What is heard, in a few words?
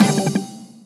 Tortimer Island - Last 30 Seconds Fanfare, 3DS; Animal Crossing New Leaf; OST